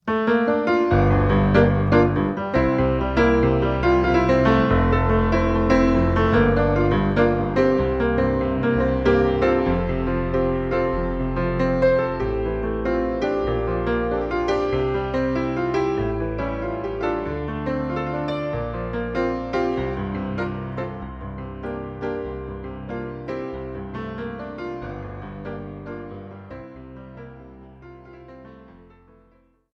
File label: Staccato